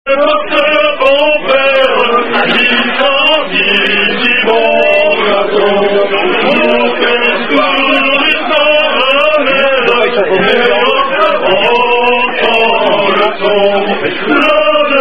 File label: French Rugby Song; Rcdr Singers